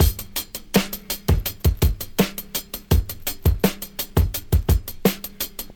83 bpm hq breakbeat sample D# key, Free drum beat - kick tuned to the D# note. Loudest frequency: 2776Hz